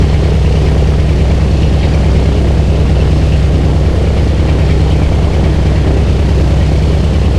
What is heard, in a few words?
b17 rear, engines; War Thunder